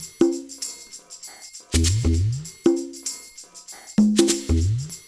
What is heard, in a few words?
percussion loop; InVision Interactive; Percussion Slam; KWICKIE